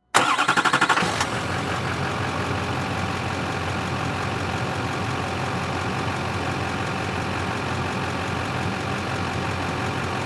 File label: Transportation Truck Diesel Truck Idle Off Mic In Front Close